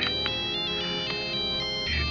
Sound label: phone ring